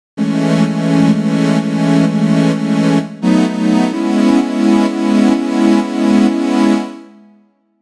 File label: Synth Pad